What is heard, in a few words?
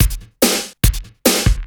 CB LOOP; Zero-G; Chemical Beats